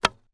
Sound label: medieval scythian recurve arrow shoot from bow; still north media